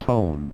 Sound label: tone